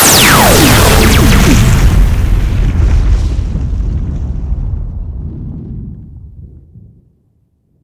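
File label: knockout blaster shots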